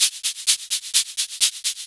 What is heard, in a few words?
Electro Loop 128BPM; Vengeance Samples